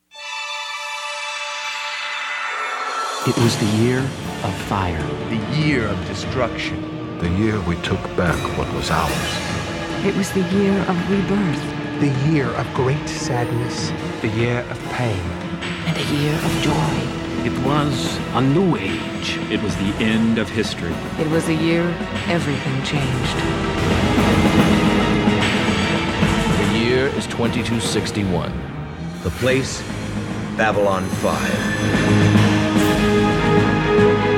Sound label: Main Titles with Narration